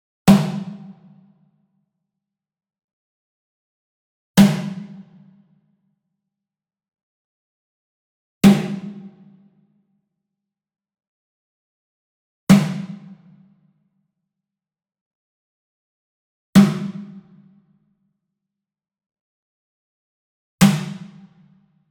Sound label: Wood Percussion Wood Drum Mounted Hit Whip Medium; boom library; Cinematic Strikes Construction Kit